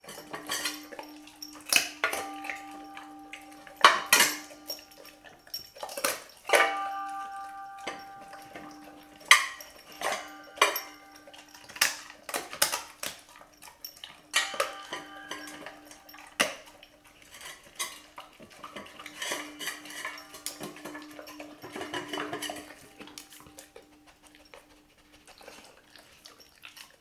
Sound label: animals dog labrador eat chicken bones in metal bowl; sound effects one